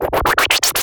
sound design wet white stutter sweep; dustyroom

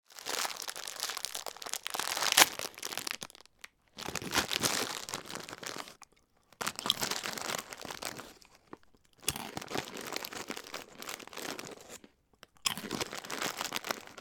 eat potato chips crisps from packet; human; sound effects; sound effects one

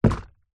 food drink smoothy bottle down on surface; zapsplat

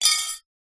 cutlery tea spoons x2 drop into glass jar; household

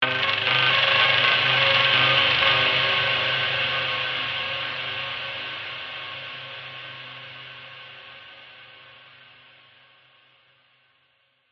cinematic stab tense grating fear; sound design

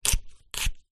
food knife slice cut habanero chilli

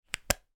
household game cartridge place inside case; nintendo ds

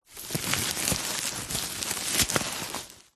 foley sack mesh thin handling movement

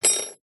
metal peg small thin set down wood

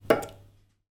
household soap bar drop into soap dish

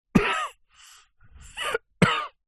human male cough soft wheeze; zapsplat

